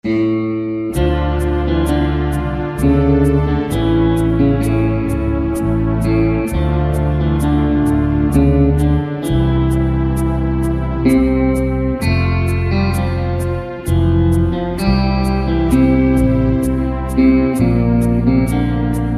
Guitar

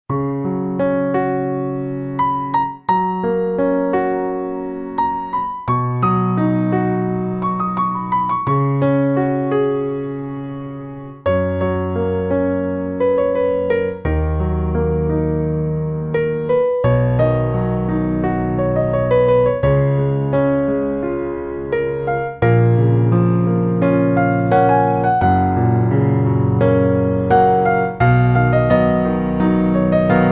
Piano

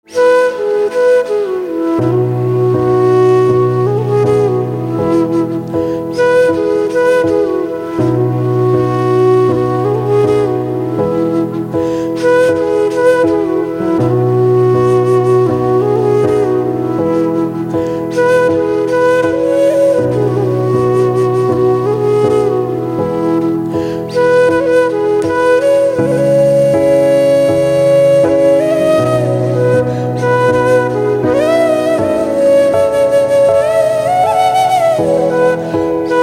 Flute Slow; instrumental ringtones

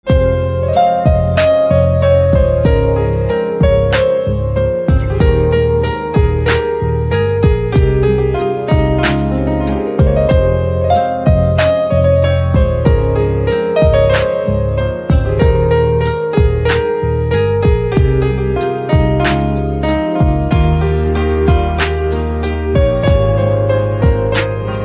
Piano Instrumental Ringtone

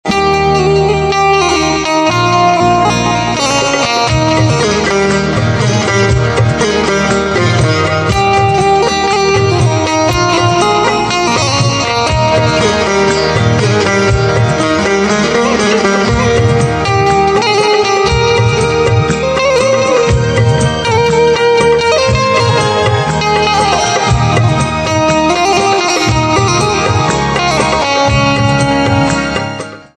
Banjo

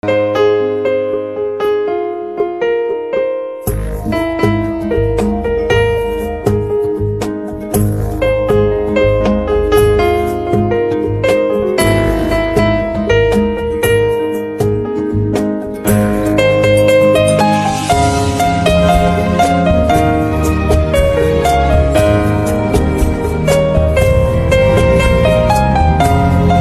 Piano Instrumental Ringtone

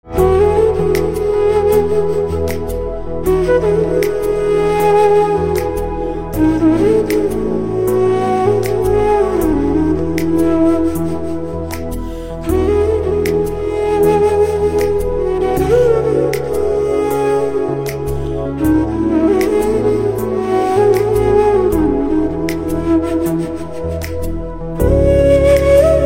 Flute

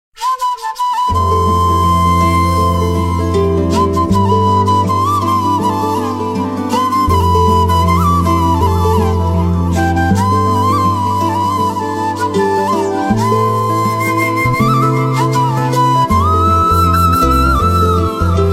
FLUTE ringtone

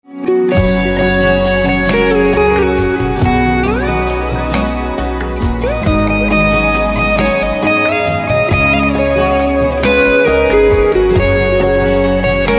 Guitar

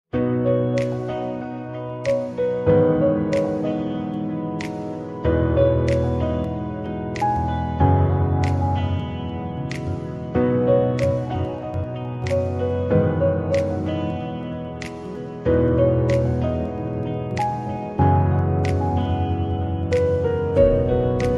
Piano Silent

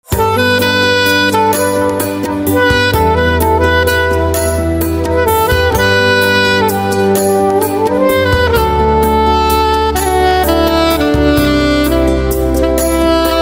Saxophone

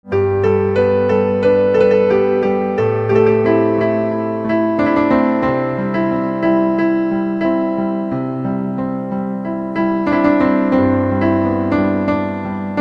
Piano

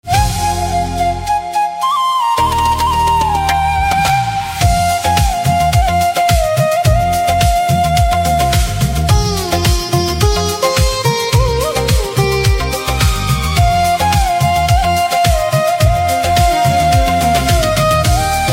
Flute

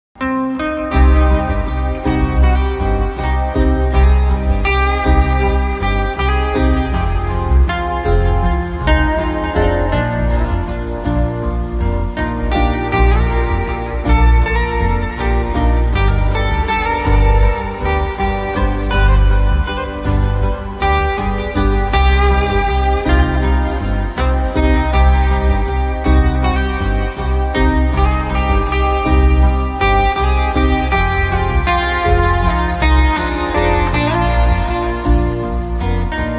Guitar Silent; instrumental ringtones

